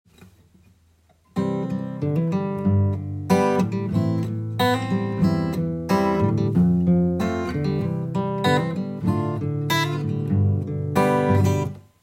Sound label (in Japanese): パターン2 am7